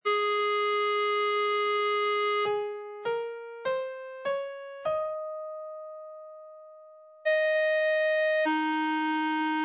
ab major eb

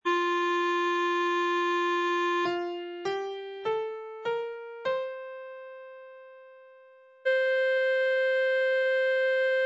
f major c

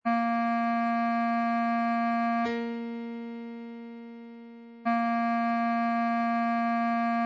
bb major bb

